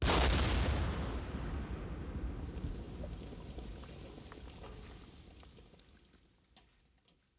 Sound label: mortar hit, weapons; dod 1.3; dod enhancement pack